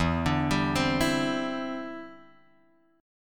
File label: E Minor 7th Sharp 5th, down, Guitar, Drop D